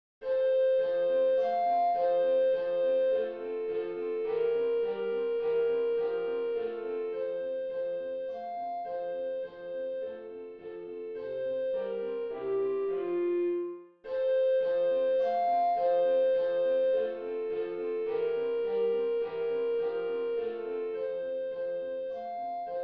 danse allemande; beethoven ludwig van

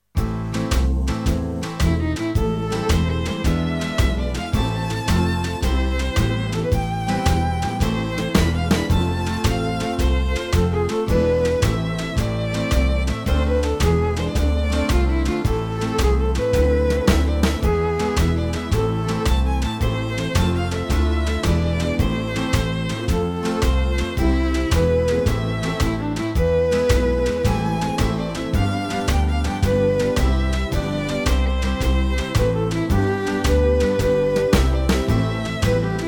fantaisie

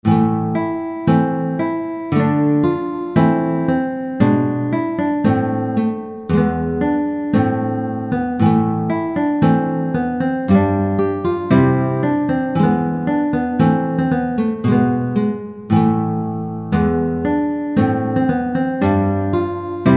guitare

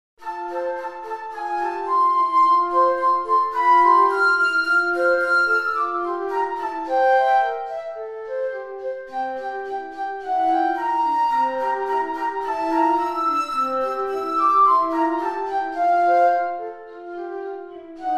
duo pour flutes; vignon denys

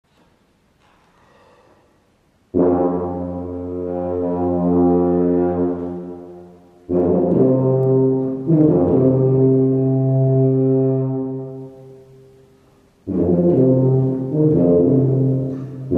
signaux pour tuba ou trombone

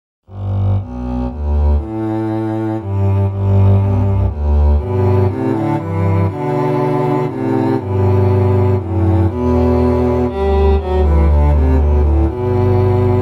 petit duo en sol pour contrebasses; aubert francois

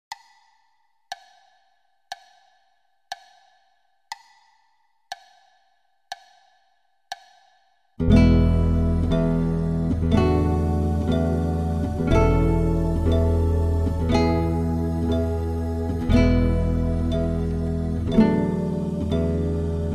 guitare; initiation

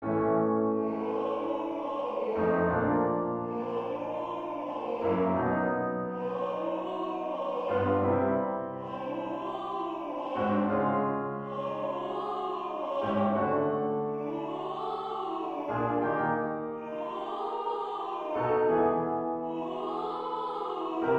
mise en voix echauffement vocal exercice vocal vocalises

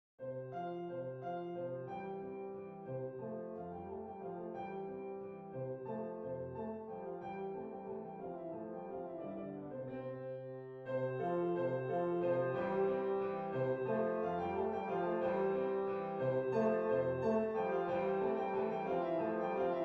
gavotte majeur; corelli arcangelo

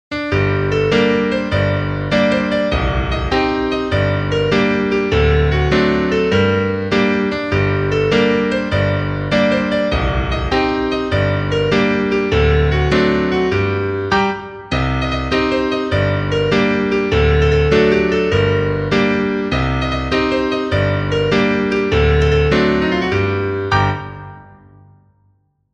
valse etude pour jeunes pianistes

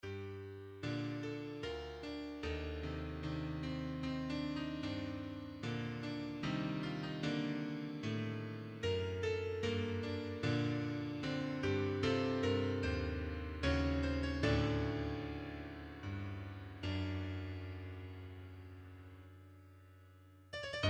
danse hongroise en sol mineur

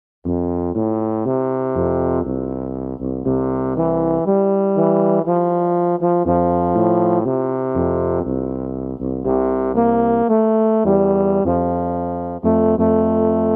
petit duo en fa pour tubas

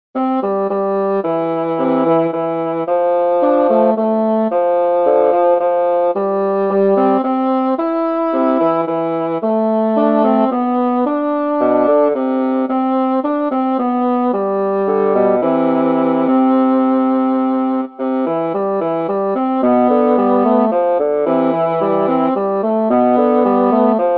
petit duo en ut pour bassons

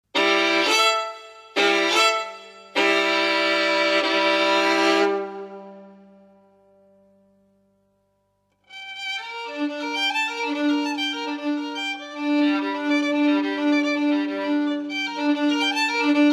version violon; Resseguier Gandels, Richard Bruno

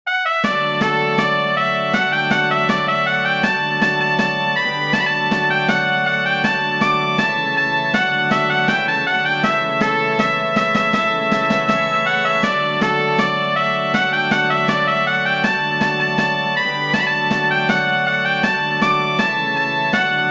rondeau sinfonie fanfares

